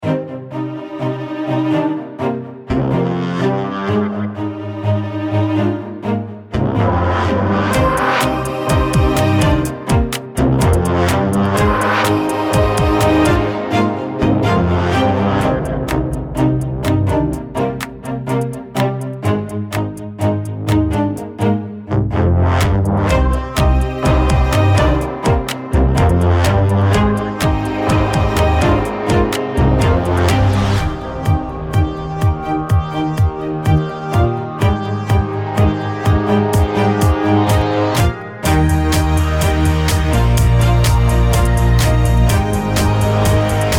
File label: hit the main floor